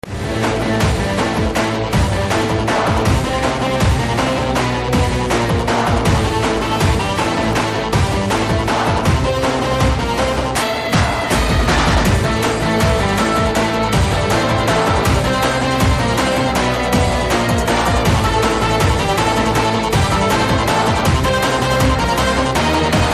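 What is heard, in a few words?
background music Theme Ringtone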